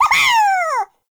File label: Trapped Sprout Falling Vocal; Characters; Friendly; Sproutfolk; Fey Hub Sprout